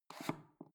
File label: foot switch dimmer slide up